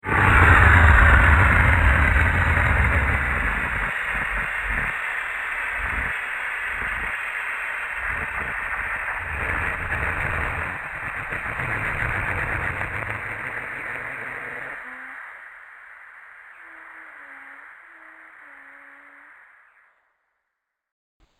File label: Dirty Crackle Exhaust Whoosh; Whoosh Sound Effects